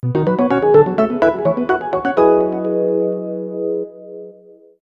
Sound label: Mission Complete; Elebits; Nintendo Wii